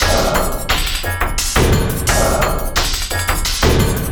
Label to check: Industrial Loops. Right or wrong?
Right